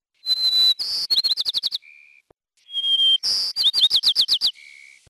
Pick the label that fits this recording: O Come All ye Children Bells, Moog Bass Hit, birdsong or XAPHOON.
birdsong